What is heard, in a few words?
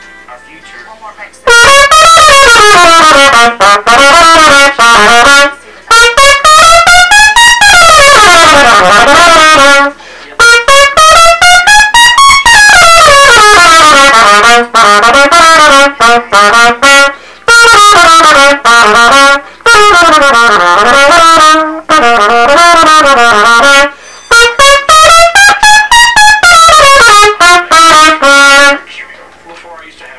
trumpet